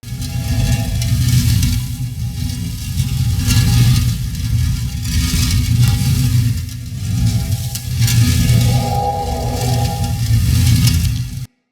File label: Fort Machine Spin Loop; Ambience